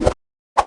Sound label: stab; josephine